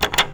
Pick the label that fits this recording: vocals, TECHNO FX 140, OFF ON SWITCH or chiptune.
OFF ON SWITCH